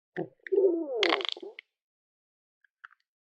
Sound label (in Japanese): お腹のぎゅるぎゅる音